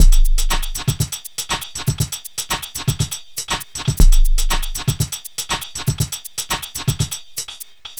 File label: Animatron Beat, Composite Beats; Sonic Foundry (Sony Creative Software); Myths of Technology